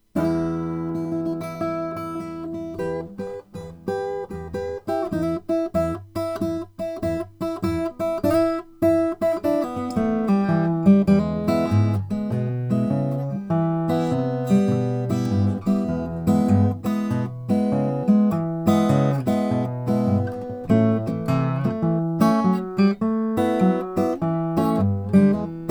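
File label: tippin 000 12c brazilian rosewood, guitars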